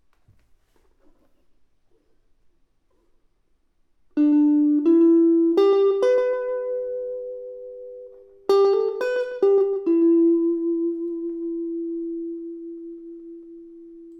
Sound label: Elect Guitar